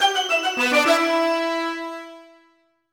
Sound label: Music Sting Ball Locked, pinball